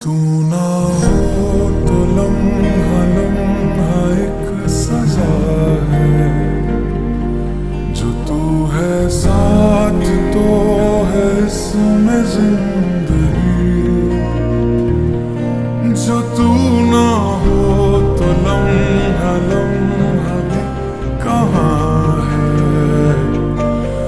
Slowed Reverb

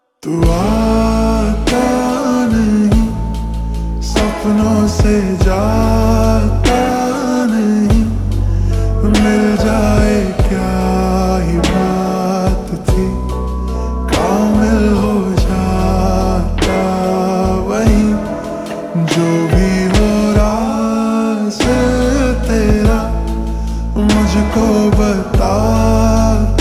Slowed Reverb

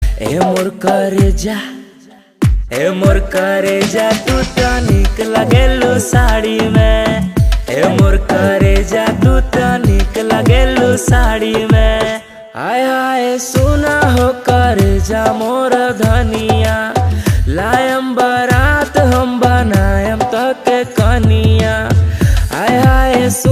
Ringtone

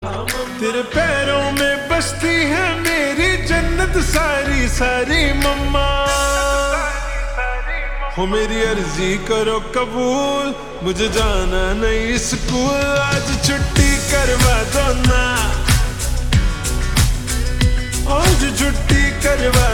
Ringtone Slowed Reverb

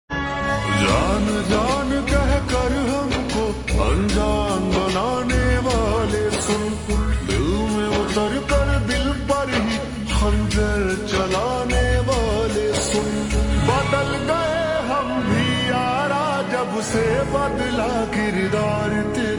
Slowed Reverb

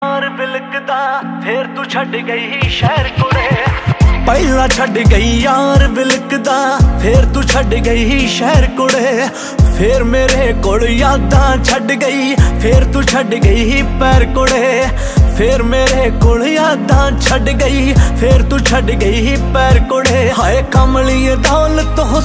Ringtone

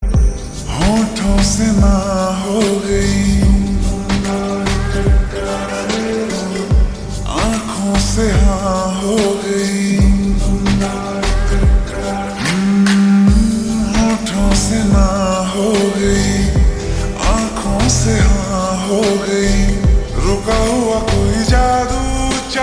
Ringtone Sloewd Reverb

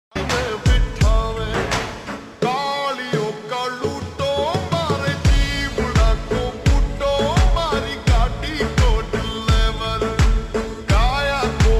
Slowed Reverb; rajasthani

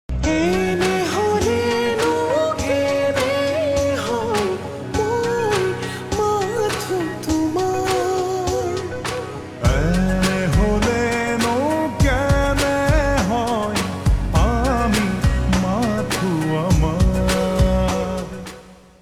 Ringtone Slowed Reverb, bengali

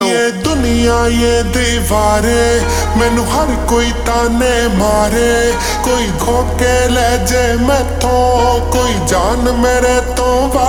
Slowed Reverb

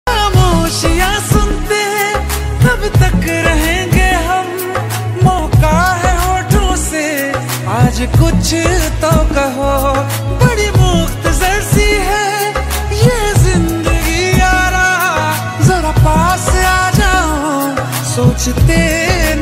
Ringtone

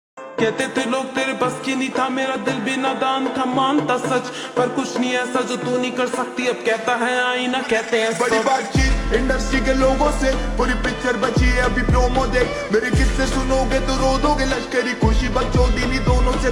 Ringtone Slowed Reverb

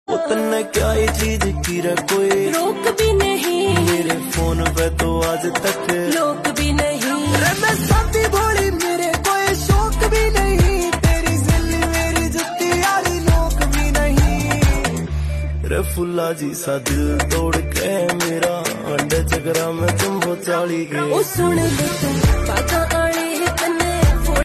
Ringtone

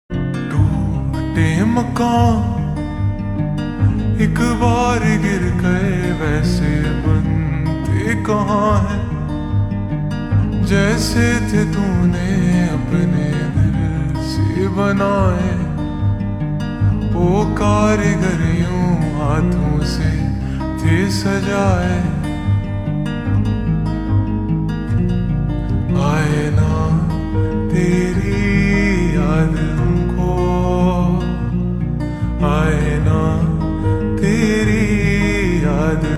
Slowed Reverb

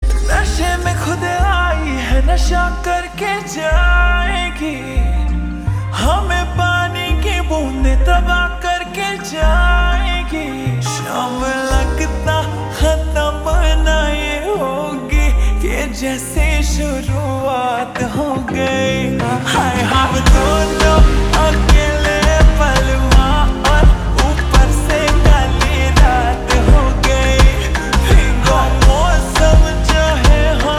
Ringtone Slowed Reverb

